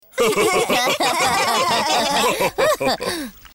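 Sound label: whole family laughing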